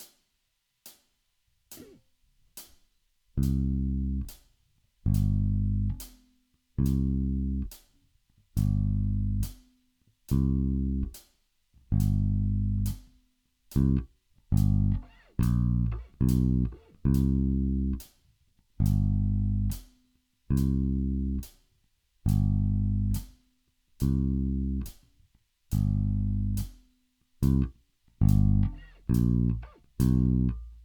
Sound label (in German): dixieland oder samba; Karneval